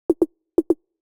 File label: Drum Loop, 124 BPM Percussion; Cymatics; Vibes II Drum Loop Collection; Aftermath